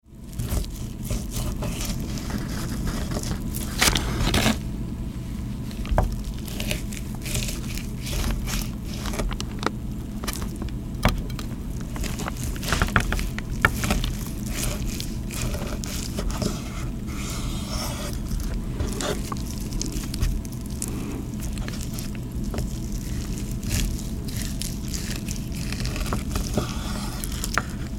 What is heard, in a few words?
meat cutting